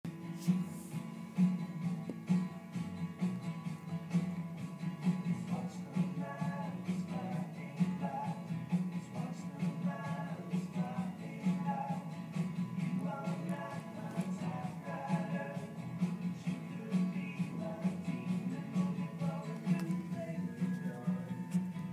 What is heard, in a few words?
music recorded through the wall